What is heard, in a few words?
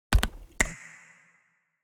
logo minimal